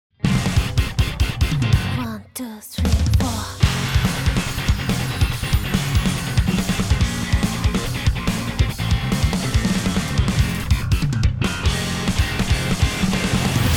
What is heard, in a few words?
Lead EG MinusOne; NCS オーディション楽曲